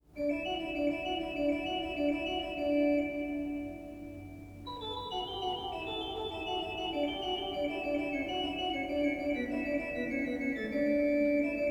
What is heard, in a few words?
Fugue in D major, Bach Great Organ Works; Prelude Fugue in D major, BWV532 - II. Fugue; Johann Sebastian Bach